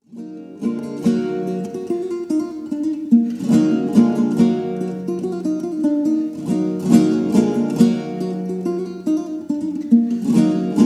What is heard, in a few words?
Gavotte; c. 1625 Matteo Sellas; Roncalli